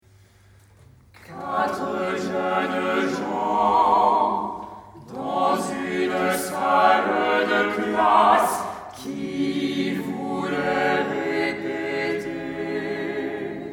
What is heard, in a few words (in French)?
Choeur live